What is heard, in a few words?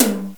Room Tom; Software Explosion 2 - Virtual Computer Pets; yamaha xg; drums